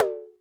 Conga Bend

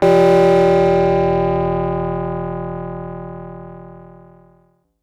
SYNTH Haunted Church Bell

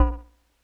Drops Kawaii As0; keys

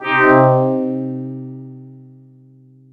Synth, Cool FM

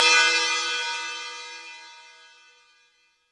Ride Cymbal

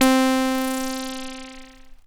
Prodigy Funk Whiz Vinyl Synths C3; vinyl keys

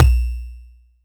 BD

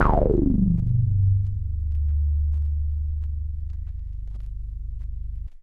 CS5 Filter Res Vinyl Synths G-1, bass

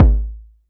BD

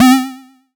SYN Wibble

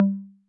Conga Low 808 Sat; Saturated

